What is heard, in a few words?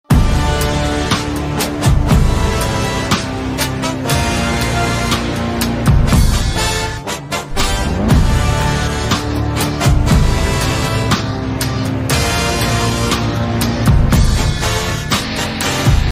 instrumental bgm ringtone mass ringtone